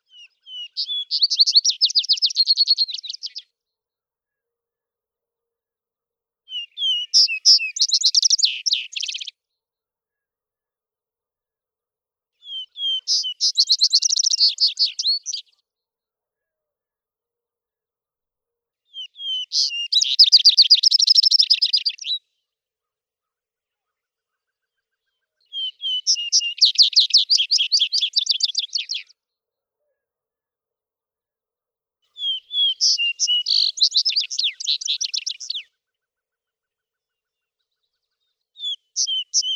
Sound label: Vesper Sparrow